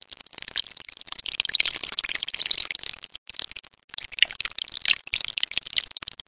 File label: liquid insects; Weird and Interesting Hits; single hits